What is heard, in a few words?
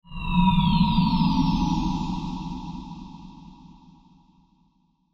Sci Fi Planetary Life Cry Alien; Aliens